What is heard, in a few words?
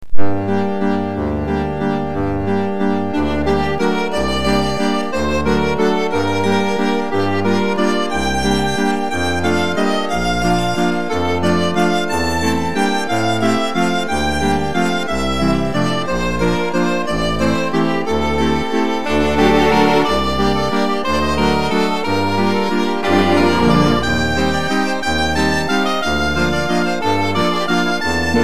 Howl